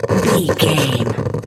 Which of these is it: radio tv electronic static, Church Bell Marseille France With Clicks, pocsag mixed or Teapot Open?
radio tv electronic static